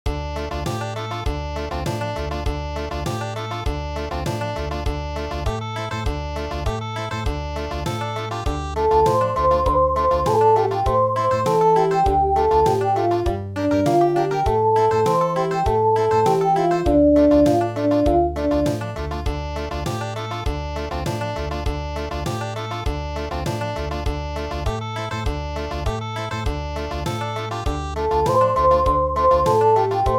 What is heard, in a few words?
chonko bushi Country